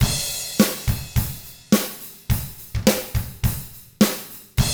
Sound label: 105 POP RK, Drums; ILIO; Double Platinum Drums 1